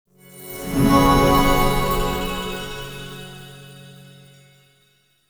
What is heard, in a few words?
Collectible Fragment Unlock Message; Warframe; Duviri